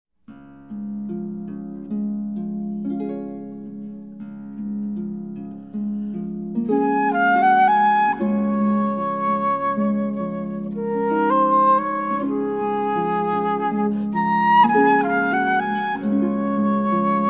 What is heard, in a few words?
harp